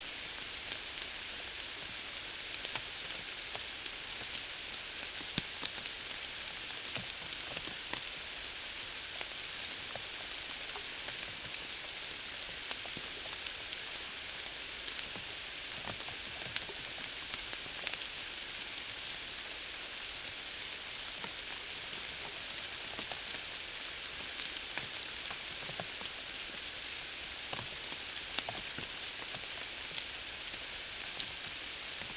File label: yamanakako sound